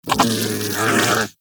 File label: Armadillo Vocal Snarl; Characters; Warframe; Hunting Critters